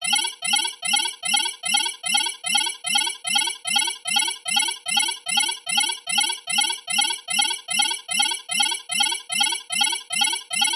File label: science fiction alarm small tiny warning high tech beeping